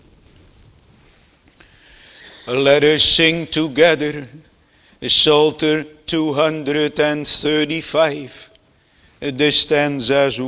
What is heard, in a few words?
Singing; The Desire of the Spiritual Bride; song of solomon 1 2